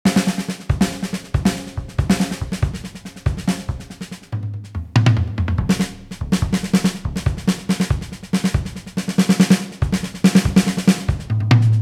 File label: random drum solo